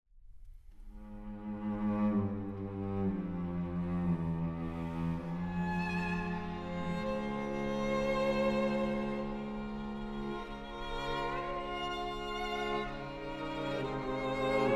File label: Quartet